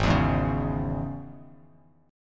G sharp Suspended 4th Sharp 5th G sharp, Bass Standard; G#sus4#5; strummed; JGuitar